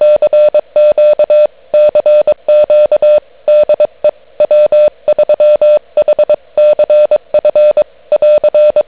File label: cw